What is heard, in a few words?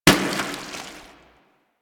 Water Balloon Impact; Warframe; Water Balloons